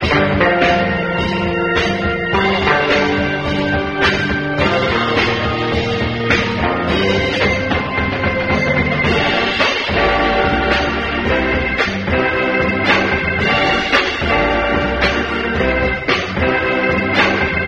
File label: guitar, loops